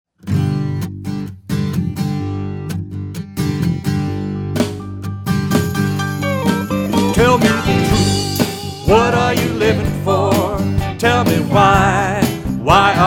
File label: Good Noise